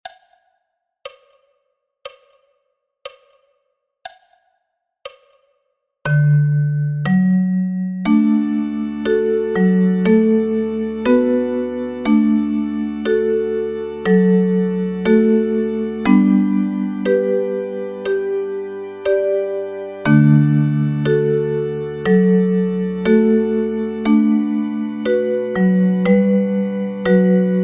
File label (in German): Uke und Bariton Sound; Michael row the boat ashore